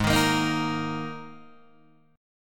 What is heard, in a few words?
G sharp Suspended 2nd, G sharp, down, Guitar, DADGAD